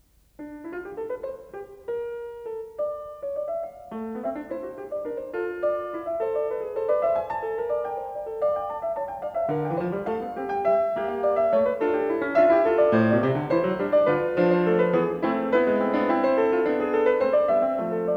Keyboard Suite; Handel; Keyboard Suite No3 HWV428; Heidsieck

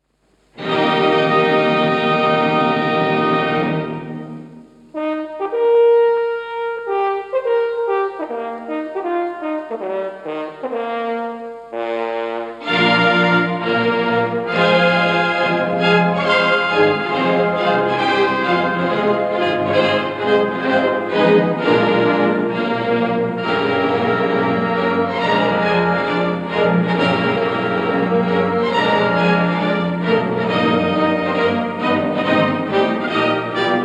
R Strauss Horn Concerto No1

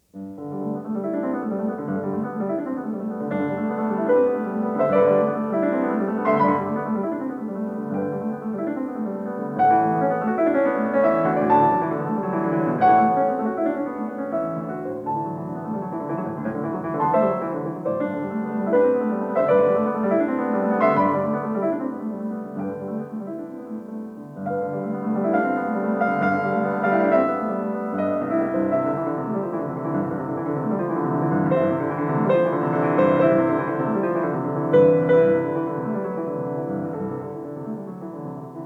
Preludes; Chopin

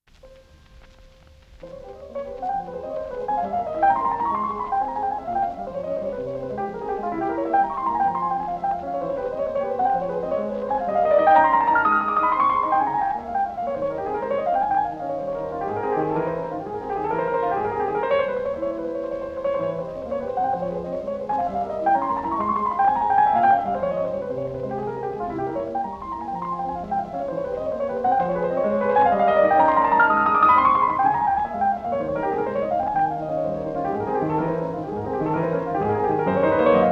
Etude Op25; Chopin

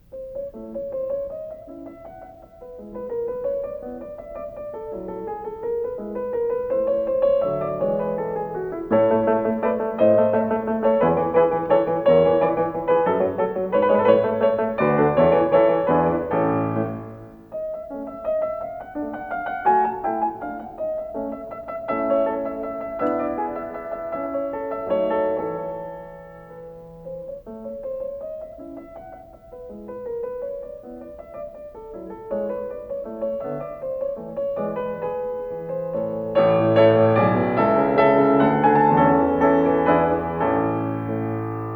Piano Sonata Alla Turca; Mozart; Piano Sonata No11 K331

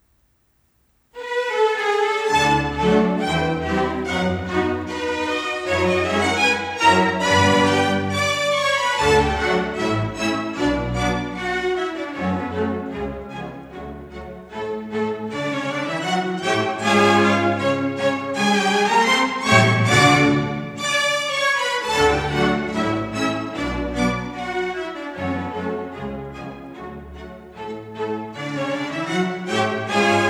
Symphony No1 Op25 Classical; Prokofiev; Markevitch

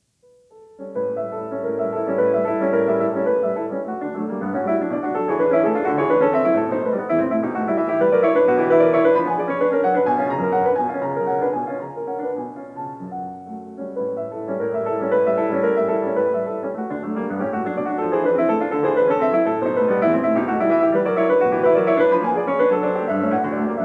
Preludes; Chopin

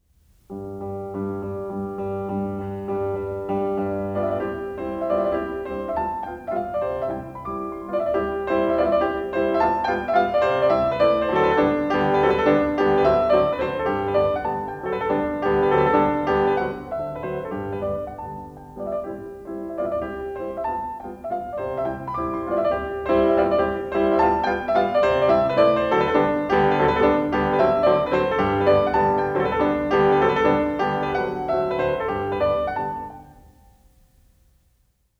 Mazurka; Chopin; Kapell; The collection of mazurkas

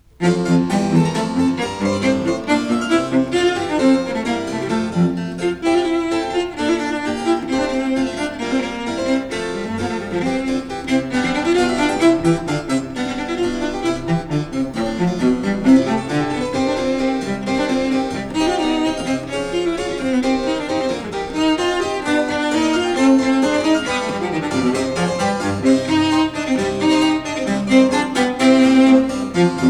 Cello Sonata; Vivaldi; Cello Sonata No2 RV41; Janigro

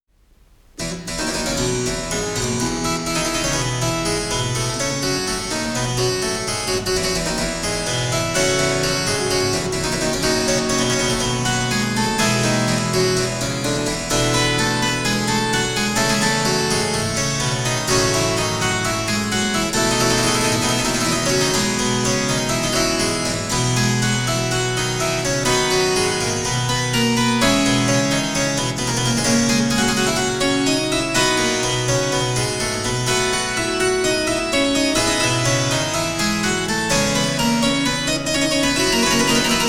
Harpsichord Suite; Handel; HWV430

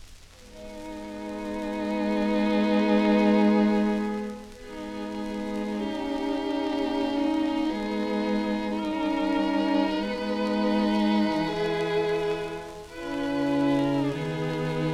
String Quartet; Beethoven; String Quartet No14; Lener String Quartet

